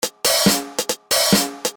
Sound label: Drums; Tambourine & Gamelan; Jazz Funk